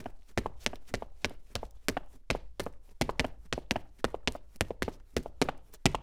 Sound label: cement heels boots and stairs